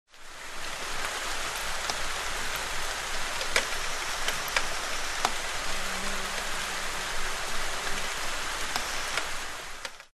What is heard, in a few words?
rain and fly